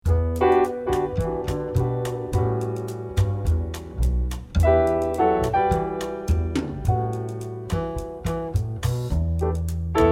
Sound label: latin jazz key of D; 2-5; ritme puls en timing